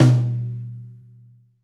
Pop Tom, Roland BK-5

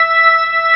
ORG VK, B-3 ORGANS Vinta Keys B3

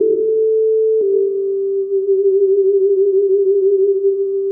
Keyboard

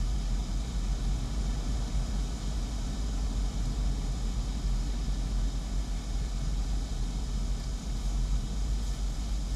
Ambience Loop, outdoor

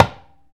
TABLA, INDIA PERC; Roland - LA Composer vol. 1

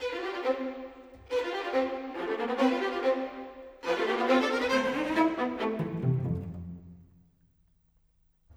Strings; Sonic Foundry (Sony Creative Software); Orchestral VOL-2; Modern 12

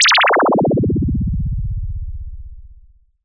Synth FX

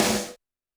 Snare, Roland TD-20

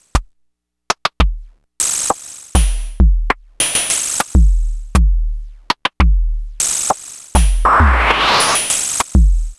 Money Groove, Beats

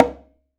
Conga Slap, Perc; Akai MPC500

